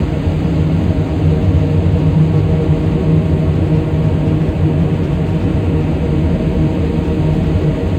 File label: Drones & FX F-